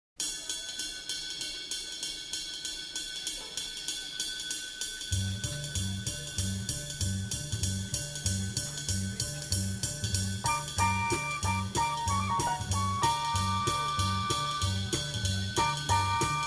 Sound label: big noise, drummerworld